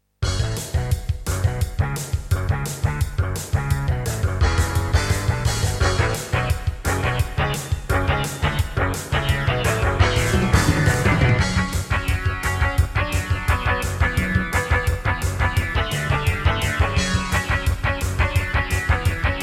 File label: Intro, Casio CTK-2100; Duke Nukem 3D